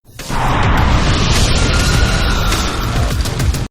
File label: wall